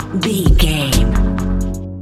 rhythm stinger, A relaxed and chilling piece of music; great for light documentaries, background music for tv shows